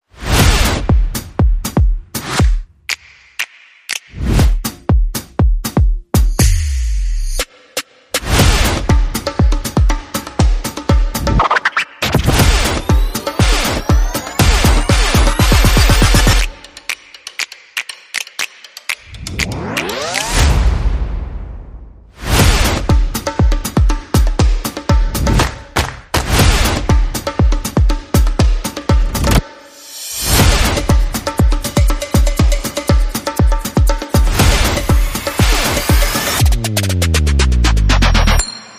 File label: Showtime Shuffle, No Horns